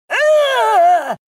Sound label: Death Sound